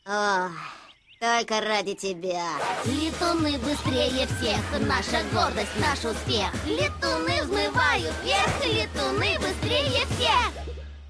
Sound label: Chant, Russian; Junior Speedsters Chant; Season 1